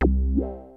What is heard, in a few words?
TECHNO BASS; Kid Nepro; Elite